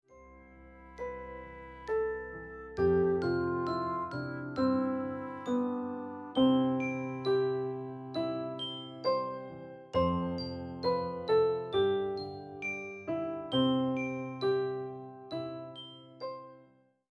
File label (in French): Version instru